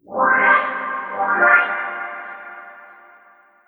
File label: ORCHFX, orchestra, Asian Traditions